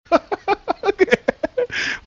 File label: laugh extreme